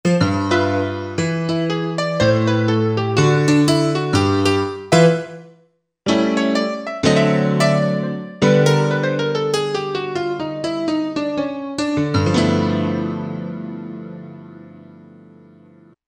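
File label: Flange Piano, Korg MSC-8S Percussion; FlngePiano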